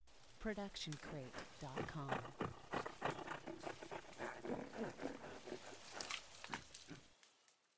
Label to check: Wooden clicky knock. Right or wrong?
Wrong